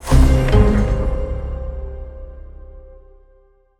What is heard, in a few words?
win medium; netent